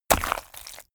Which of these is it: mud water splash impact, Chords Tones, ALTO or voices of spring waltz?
mud water splash impact